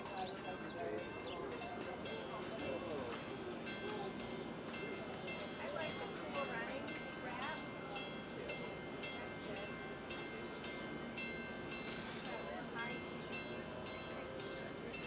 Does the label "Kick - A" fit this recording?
No